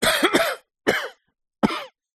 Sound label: human male 40 years old coughing